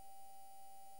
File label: mic, mars2020 edlcam